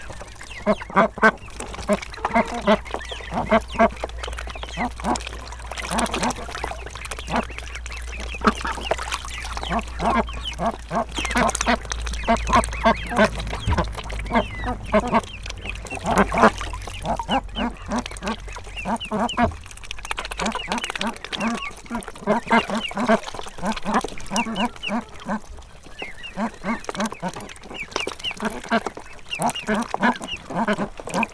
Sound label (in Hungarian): vizet isznak, házikacsa; állathangok; paraszt udvar